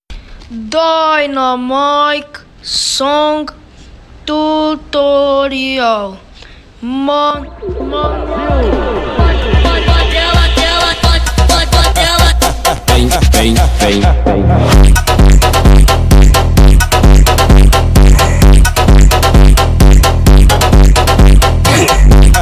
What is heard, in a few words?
phonk remix